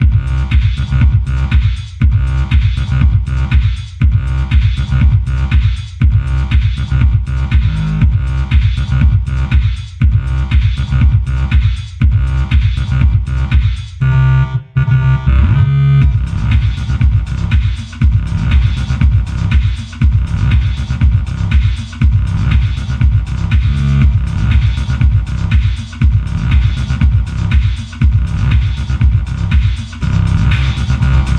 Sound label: Club Loop